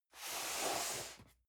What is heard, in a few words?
cardboard box medium slide soft; objekte